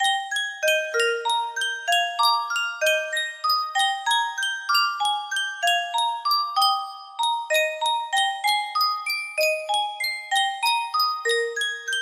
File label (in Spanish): Yunsheng caja de musica, music box melody